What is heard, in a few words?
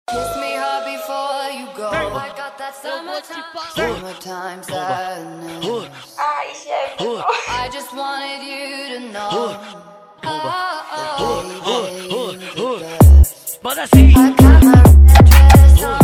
mega bass